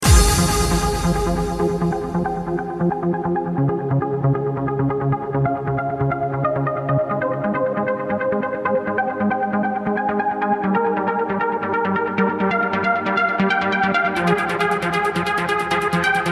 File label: Trance; Trance Anthems